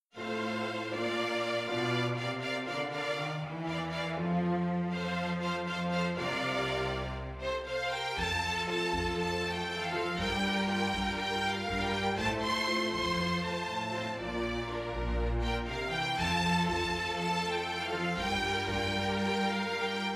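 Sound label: Strings Orchestra piano accompaniment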